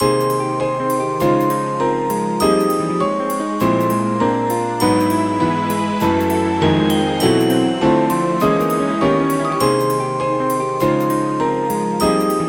Jazz

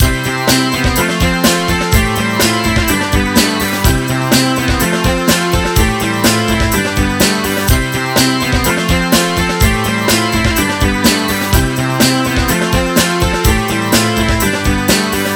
Garage

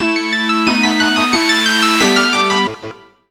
red alarm

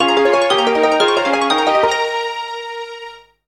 Blue Alarm

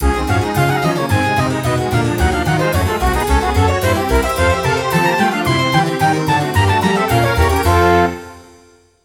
Baroque long

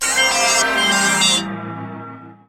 Mantra

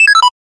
alert

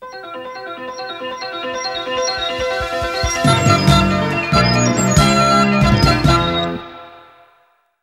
jingle

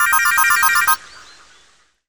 Parrot

